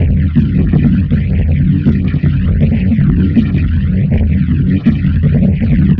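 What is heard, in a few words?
Master Loop, beats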